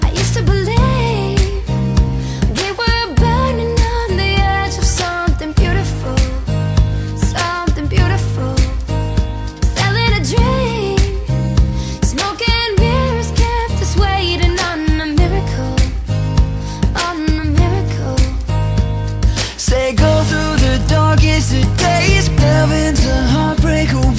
Hollywood Song Ringtone

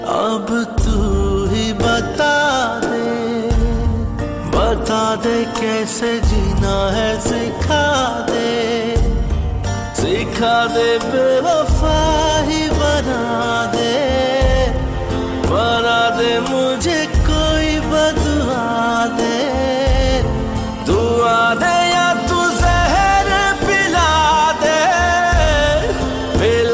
Sad Ringtone

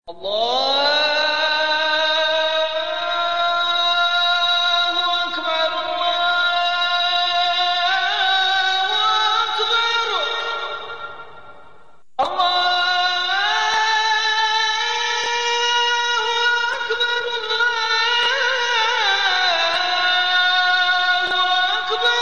Makkah Azan Ringtone, New Islamic Ringtone; ringtones

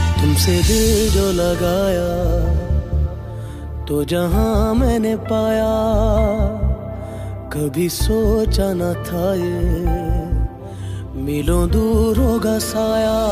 Very Sad Breakup Song Ringtone

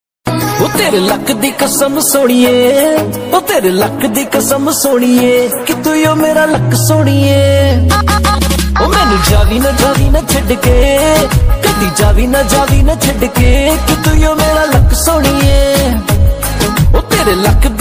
Punjabi Song Ringtone

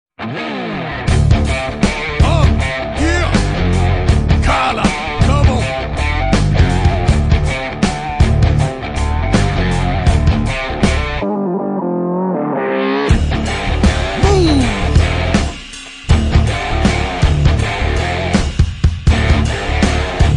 Tamil Ringtone; Kaala

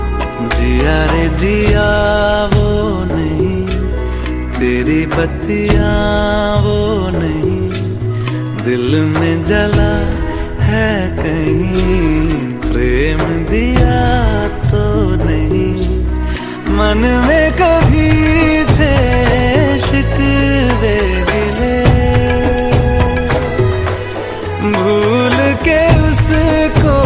Sad Song Ringtone

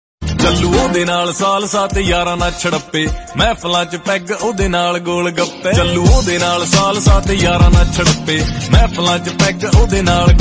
Punjabi Ringtone

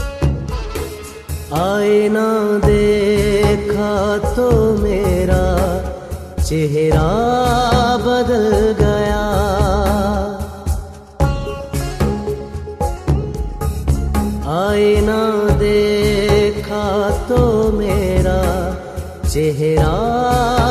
Classical Song Ringtone

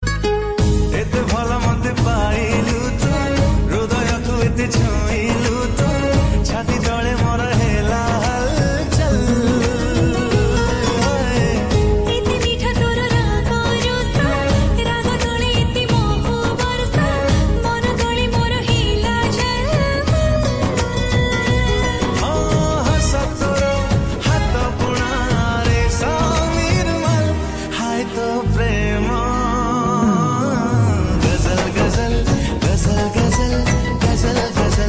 Odia Song

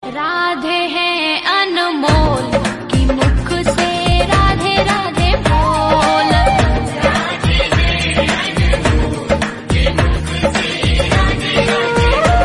Bhajan Ringtone

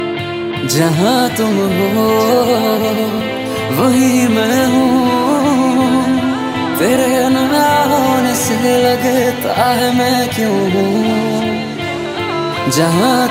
Bollywood Song Ringtone

